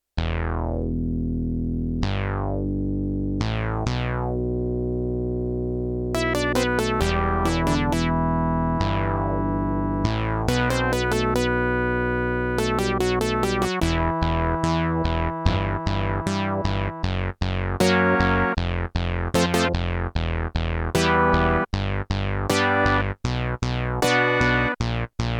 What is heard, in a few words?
Single VCF Brass, Prophet 5; Yamaha TX16W